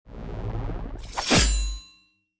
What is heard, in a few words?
Skill Slash; starbites